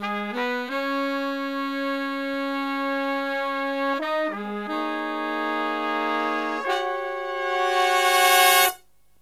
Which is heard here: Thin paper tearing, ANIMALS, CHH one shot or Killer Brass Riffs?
Killer Brass Riffs